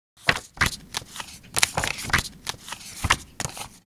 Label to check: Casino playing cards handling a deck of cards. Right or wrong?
Right